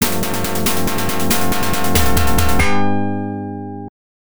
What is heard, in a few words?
Boxing Win; Dynamite Dux; system 16b arcade; Luck Ducky Baby